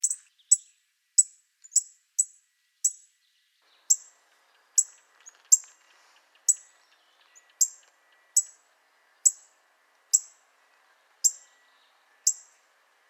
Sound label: Northern Cardinal Cardinalis cardinalis superbus